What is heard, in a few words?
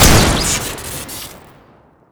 Fire Crit, weapons; Survivalist; tf2classic